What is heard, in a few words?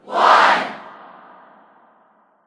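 Ambience Tennis Crowd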